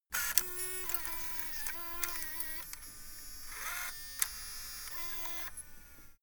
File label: servo move click